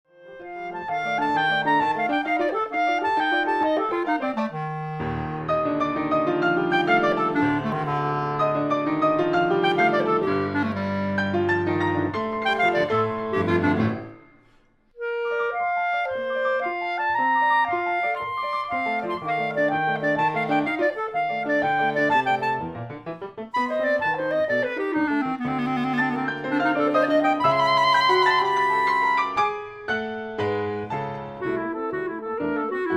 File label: Clarinet and Piano